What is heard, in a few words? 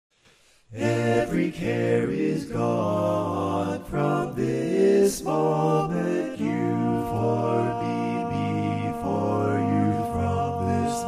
Bass, barbershop tag